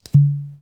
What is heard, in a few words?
collect; super snuvi